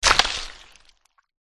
tsar scorpion attack contact bottom

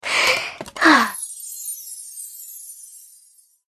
valentine pet death; pet drakonchik feer

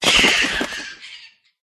bat wound

